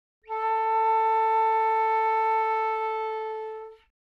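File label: flute A4; 開管と閉管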